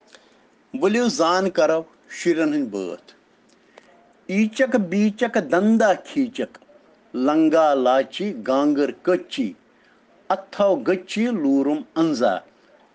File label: Children Rhyms in Kashmiri